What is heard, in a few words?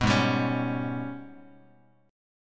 G sharp Minor Major 11th, Bass Standard; strummed; JGuitar